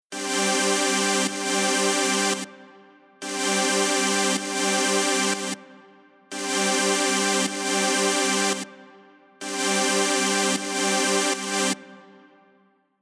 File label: Chord Far; EQ Comp Basic